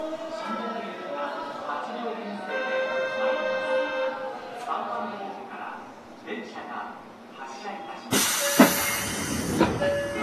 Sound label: vvvf, mitsubishi